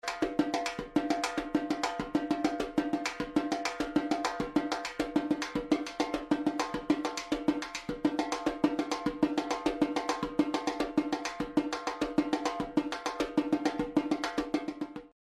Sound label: repinique; le bresil